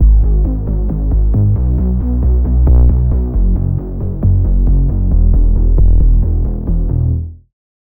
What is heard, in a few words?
Bass; Routed Sound; Spire Expansion - Reality Maker Vol.1